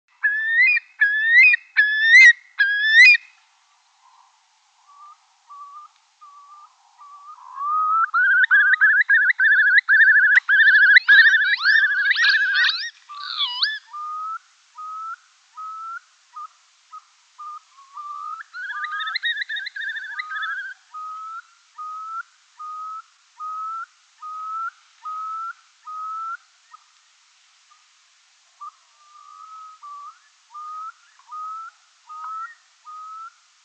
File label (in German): Grosser Brachvogel Gesang und Rufe; uni-kl